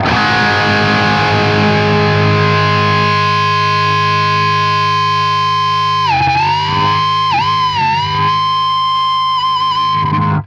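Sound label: power chord G# cut, guitar; East West; Steve Stevens Guitar Samples Collection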